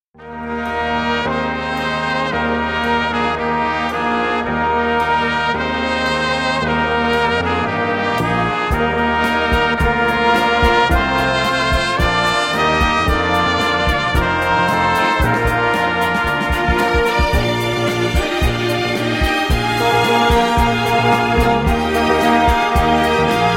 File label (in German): Orchester; O, du froehliche-Herbei, o ihr Glaeubigen